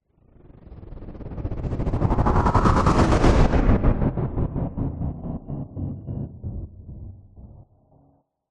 sound design sweep chopper stutter fast dark chorus